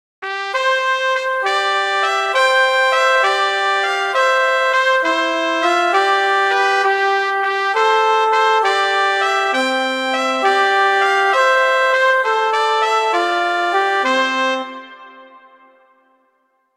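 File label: I Saw Three Ships, Christmas flute quartet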